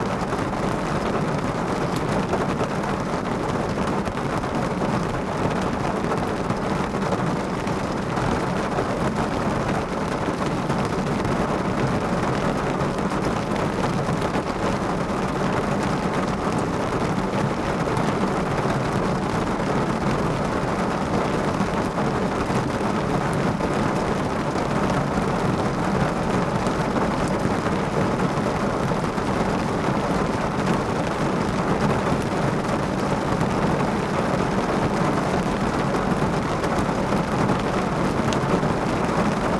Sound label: Hard Rain In A Car, Field Recordings Oregon; Omni Gardens